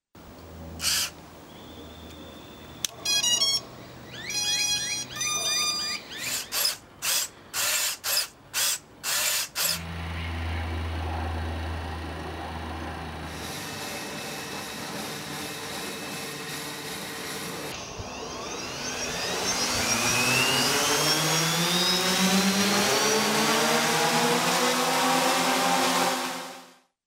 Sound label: automated sideways drone window cleaner